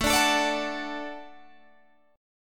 Gb Minor Sharp 5th A

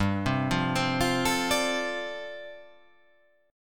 G 9th Suspended 4th

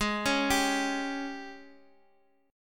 Gsharp Major 7th Suspended 4th, Guitar, Drop D

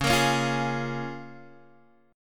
Db 6th, Guitar, Open G

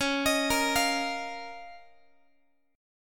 Db 6th Add 9th Db, down, Guitar; Db6add9; arpeggiated

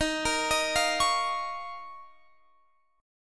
F 7th Suspended 4th Sharp 5th D sharp

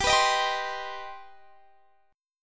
C Minor Major 7th G

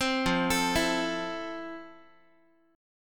F Major 7th F, Guitar; arpeggiated

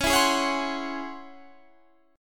Db Minor Major 11th Db Guitar Standard; DbmM11 strummed; JGuitar

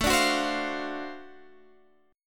D 7th Sharp 9th A, chord

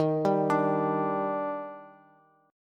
Eb Major 7th, Bass; EbM7; arpeggiated; JGuitar

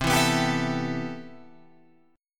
C Major 13th C, Guitar Open F; CM13 strummed

